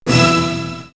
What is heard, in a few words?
ORCH HIT; X-Static Goldmine; backtothe70's